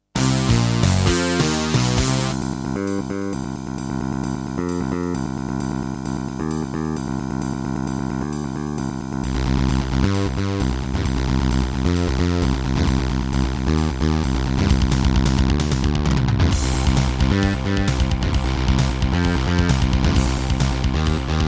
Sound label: Aztech CS9233 DB; sigil doom episode 5